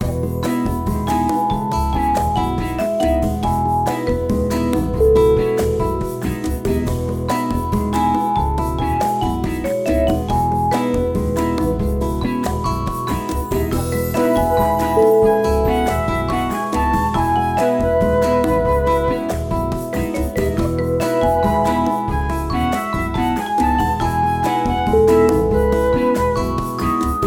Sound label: warm summer vibe